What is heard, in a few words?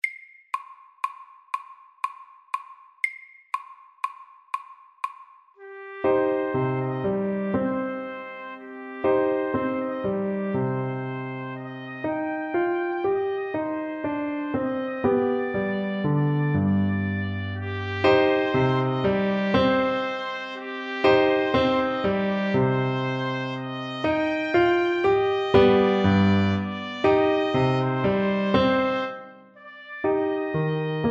Bugle horn SAKTE